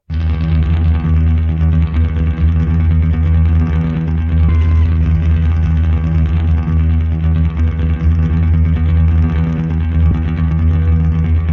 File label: lots of overdubbed guitars playing the same note; Ryan Novak